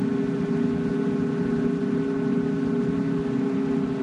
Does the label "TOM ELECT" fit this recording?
No